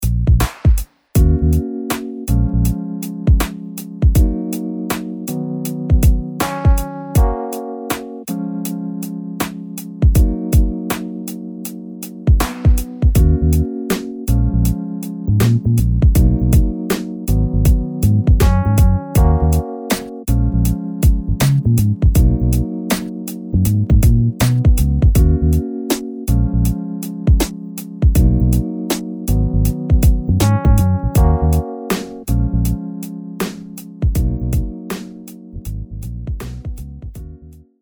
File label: electro drum kit